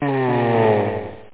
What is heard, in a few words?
moan